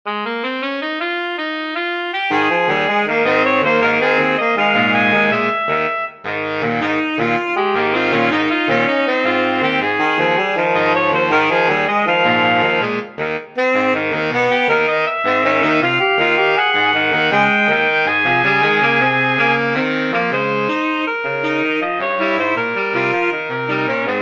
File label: Mambo sonnata